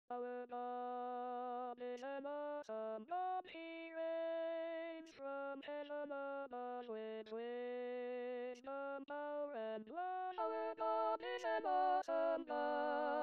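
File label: Tenors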